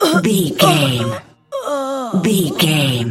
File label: voice female death torture